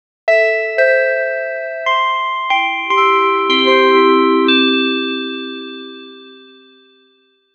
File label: a short retro computer; lofi